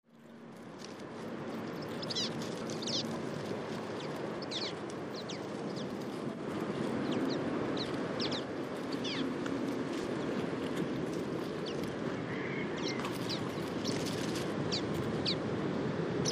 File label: Common Waxbill